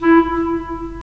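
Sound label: wind clarinet; Roland - LA Composer vol. 3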